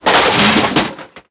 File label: metal small crash; tanks; War Thunder